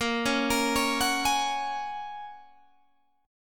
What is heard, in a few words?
A sharp Minor 7th Sharp 5th A sharp, Guitar Open G